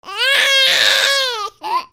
PEOPLE

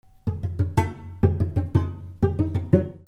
MUSICAL

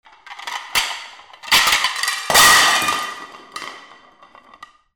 FALLING

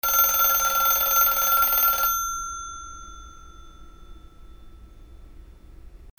PHONES

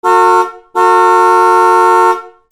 CARS

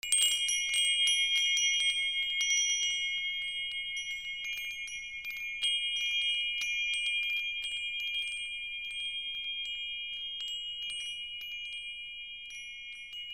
BELLS

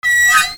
SQUEAKS

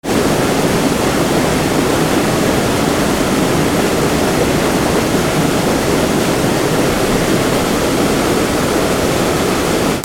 WATER

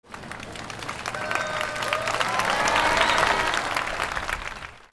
CROWDS

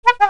BUZZERS

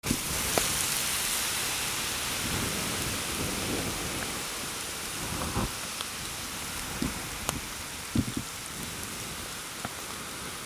FIRE

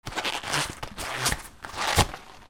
FRICTION

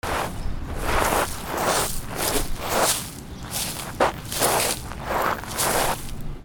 FOOTFALL

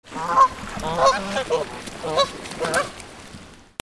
BIRDS

